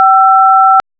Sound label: dtmf 5; 以下の各音声データは、基本的な周期関数である三角波、ノコギリ波、 方形波を用いて、特定の音声データを作成したものです。